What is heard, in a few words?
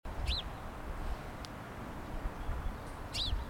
Passer domesticus; SETE